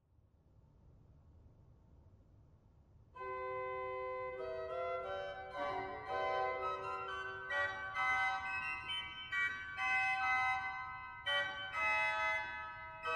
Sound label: Rauschquinte 2f, Sauer; dortmund